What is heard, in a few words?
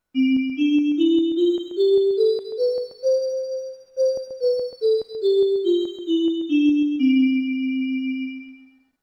fm doremi, MIDI Synth